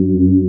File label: VO G1, ANA VOX, SYNTHESIZER; AKAI S6000 CD-ROM - Volume 1